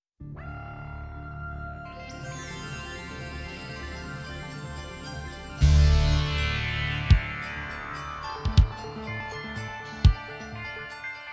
Original Drums

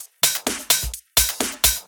Electro Loop 128BPM; Vengeance Samples

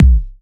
Kicks; Vengeance Samples

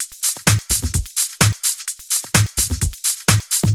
Houseloops 128BPM; Vengeance Samples

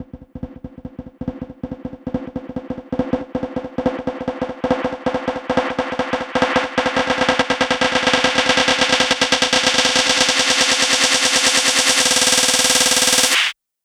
140BPM SNARE 8 Bars; Vengeance Samples; Snares&FX Pairs

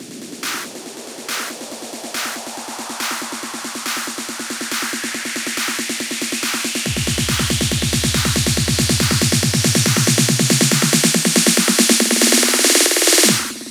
140BPM Circles 2 8Bars, Hands Up Trance Fills; Vengeance Samples

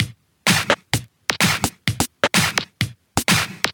Housebeats, 128BPM; Vengeance Samples; VEH2 Loops

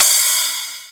Crash, Cymbals; Vengeance Samples

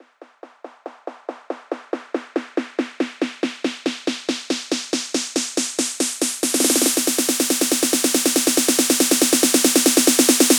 140BPM SNARE 8Bars; Vengeance Samples; Snares&FX Pairs

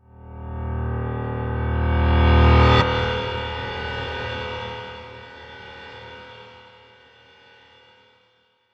Tonal FX D#

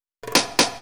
Fill 128 BPM; Vengeance Samples; VEH1 Loops

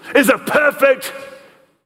Vocal Phrases; Vengeance Samples; Vocals

